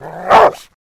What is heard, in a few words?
Rottweiler Growl